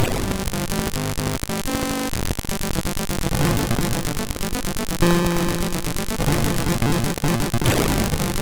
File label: Intro, MOS6581R2; MCH